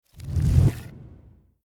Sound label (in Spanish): sale a superficie